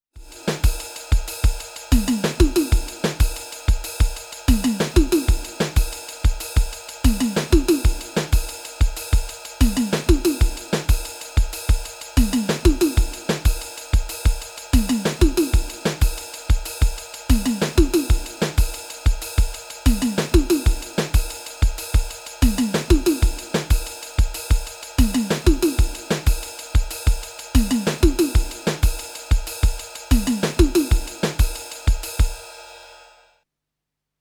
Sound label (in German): Drumtraks Groove mit Toms